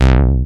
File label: Bass Juno C; Vengeance Samples